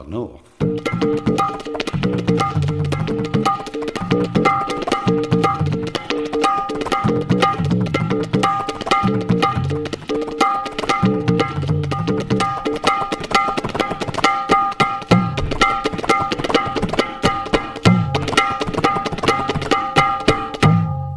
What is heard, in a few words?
Raindrop Tablas